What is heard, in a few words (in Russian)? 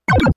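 переключение в игре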